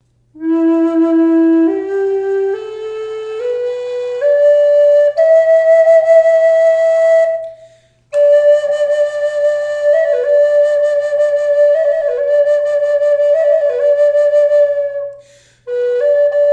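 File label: reverb, flute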